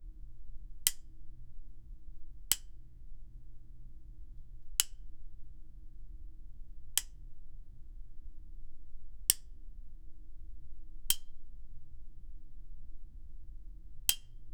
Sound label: lights flickering continu